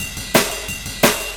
175, Breaks; Drum&Bass; EZ Rollers

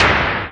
noise fx; Masterbits; Switch FX

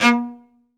SOLO CE, Strings; ILIO

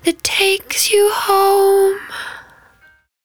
110bpm Ab, Velvet Vocal; Zero-G

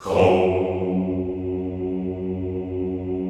HOUUU, Classical Choir

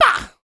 YELL, MALE HUMAN; ILIO; Vocal Planet

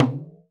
Re-Bent, Alesis D4

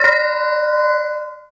FX Dance

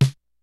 kicks snare, kid; Kid Nepro; Elite; KICKS SNARES

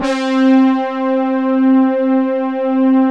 OBERH, PADS; Vintage Voltage

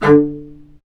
STR, Vc Marcato; Roland L-CD702; Vc Marc&Harm; Vc Marcato 1

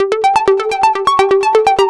Lead

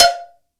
COWBELL

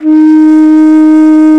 BASS BANS, Bansuris; Winds; E-MU Producer Series Vol. 7 – Old World Instruments

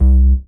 SNGL.BASS, Jungle Rave

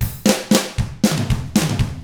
ROCK

